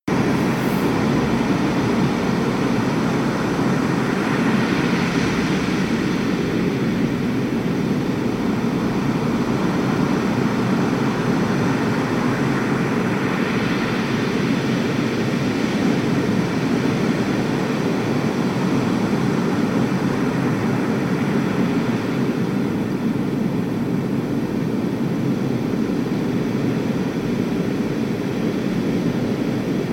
Ocean sound

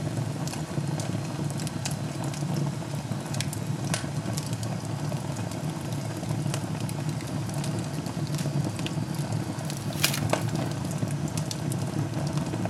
fireplace headphones

